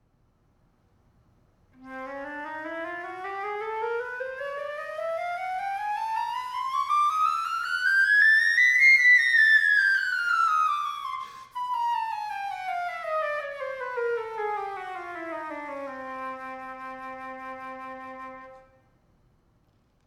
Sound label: chromatic